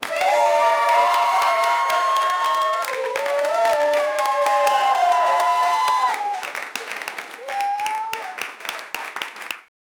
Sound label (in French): public qui tape dans les, concert